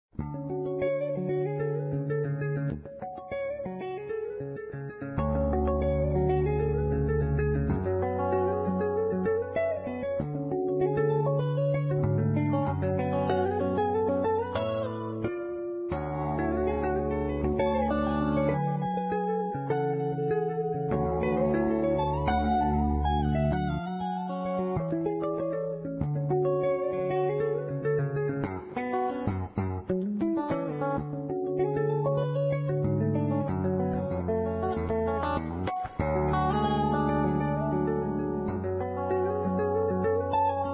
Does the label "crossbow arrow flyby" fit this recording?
No